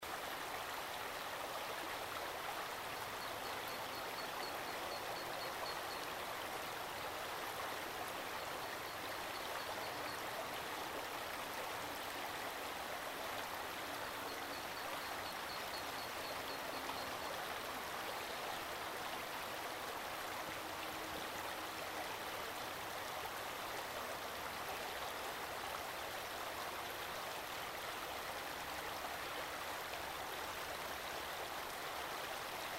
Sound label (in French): drac un torrent en ville